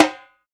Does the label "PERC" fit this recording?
Yes